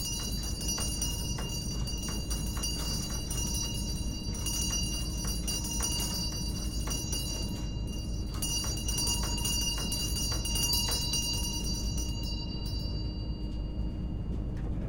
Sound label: antique mill bell ring; sonniss gdc